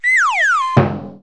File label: slide dn, cartoon; accents; Kaboom! Junior